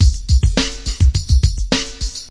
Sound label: 105 typical with tambourine, drum loops; 101 to 110 bpm